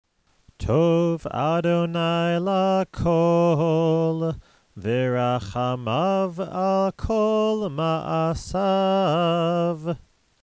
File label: ashrei